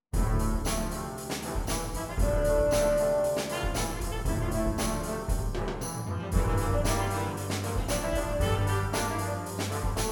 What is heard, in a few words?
backings for WOODWIND, Wind Orchestra; Grace